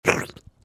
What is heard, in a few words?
gecko lick; npc